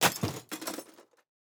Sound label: metal break